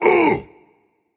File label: surprised